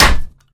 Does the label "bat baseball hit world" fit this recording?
Yes